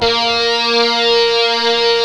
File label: stick, Chapman Stick; Analog Odyssey